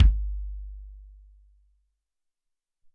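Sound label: Tek Kick, Alesis SR-18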